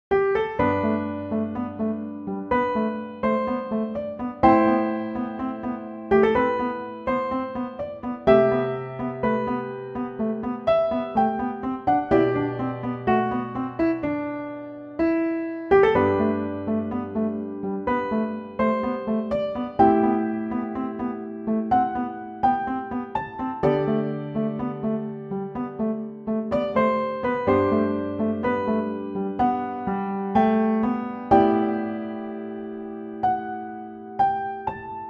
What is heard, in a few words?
setting off piano; Mikemon